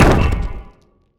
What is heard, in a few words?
Damage alien Ruins Wall